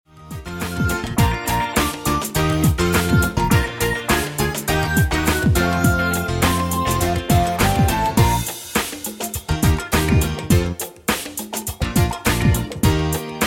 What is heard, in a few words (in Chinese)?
音樂伴奏